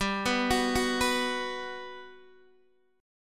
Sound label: G Major 7th, G, down, Guitar, Open D